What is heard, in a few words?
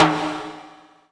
Kawai K11 Low Timbale